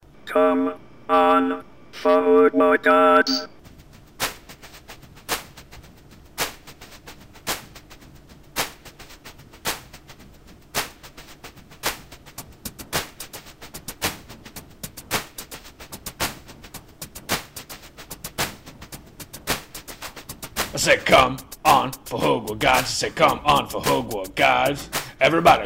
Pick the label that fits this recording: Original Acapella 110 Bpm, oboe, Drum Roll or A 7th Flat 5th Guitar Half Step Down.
Original Acapella 110 Bpm